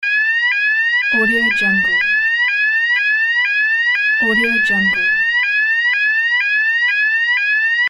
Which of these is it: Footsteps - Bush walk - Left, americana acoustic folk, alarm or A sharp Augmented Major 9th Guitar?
alarm